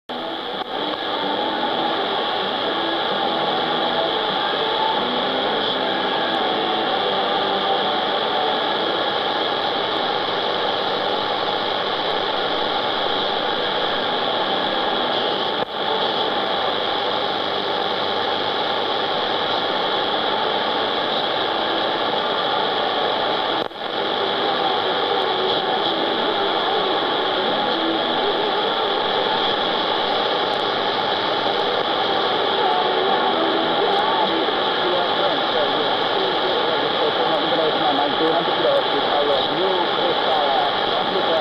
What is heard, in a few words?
9290 kHz, pirate radio; spaceshuttle